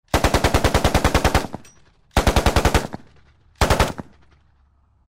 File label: gun